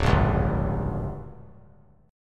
D Major 7th Suspended 4th Sharp 5th; DM7sus4#5; strummed; JGuitar

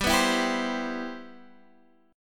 C Major 7th, G, guitar chord

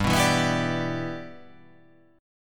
G 6th

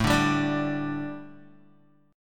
A 7th Suspended 4th A Guitar, chord; A7sus4 strummed; 5,5,5,7,7,x; 4E,4A,5D,5G,5C,6F

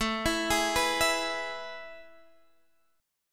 A Major 7th Suspended 2nd, chord; AM7sus2; arpeggiated; x,12,14,13,12,12

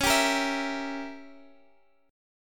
C sharp Diminished 7th C sharp, Guitar Open F; strummed; C#dim7; x,x,13,14,13,11

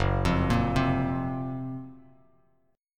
Gb Major 7th Flat 5th Bass Standard; GbM7b5 arpeggiated; JGuitar

